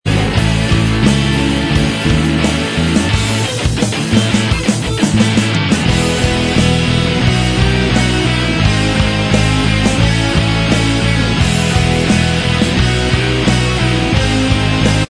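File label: instrumental, backing track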